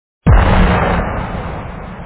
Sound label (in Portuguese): estouro bomba